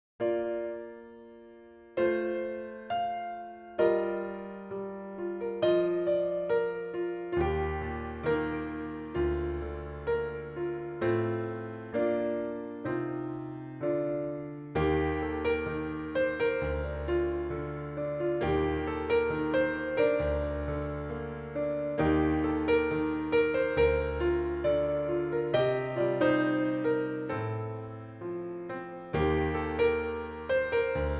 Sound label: Piano Version